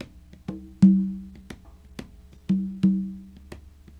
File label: SD con; Percussion